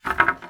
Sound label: Plank Hit